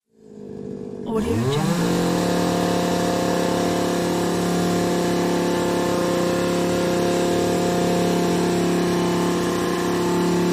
motor chainsaw trimmer

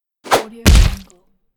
roundhouse kick with blood

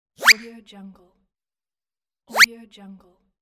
cartoon banana peel slip

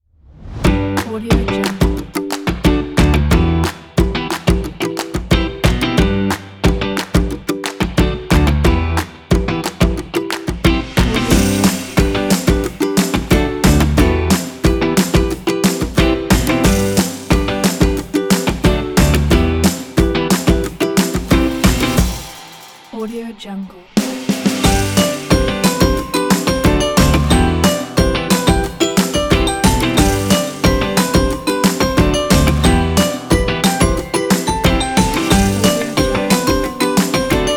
happy kit

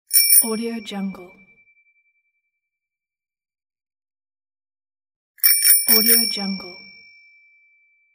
bicycle bell

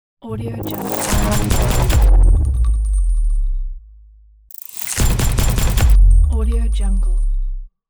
weapon logo reveal

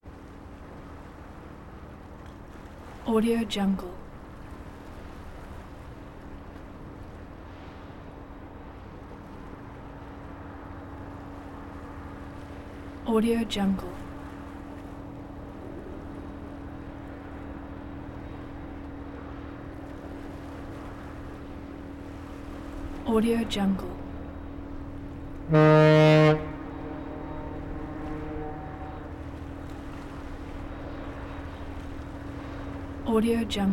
tugboat slow pass by

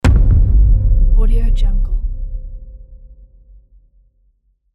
the boom

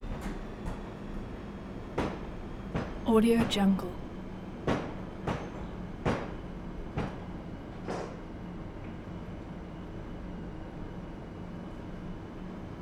kitchen ambience professional or commercial; prosoundfx

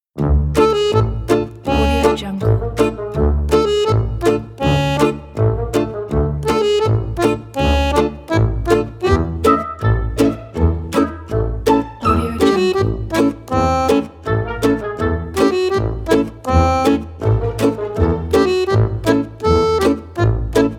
funny

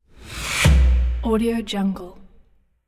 stamp of approval

